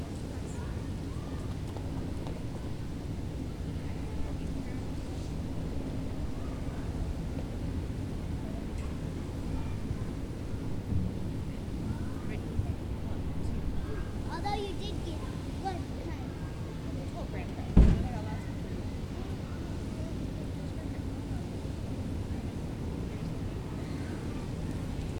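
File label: Memorial Park Close to Elementary, soundscape; Claremont; Sycamore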